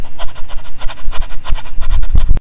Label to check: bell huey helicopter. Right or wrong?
Wrong